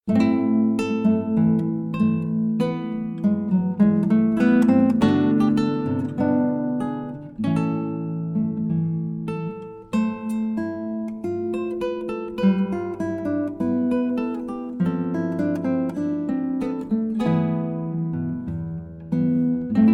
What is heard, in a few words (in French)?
pavane, Guitares Classiques